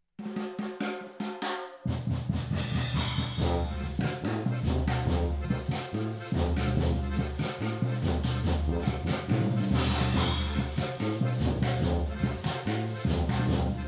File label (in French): Fanfare